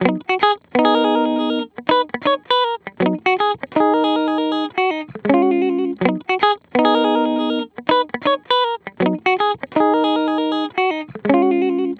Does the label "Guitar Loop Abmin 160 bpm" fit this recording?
Yes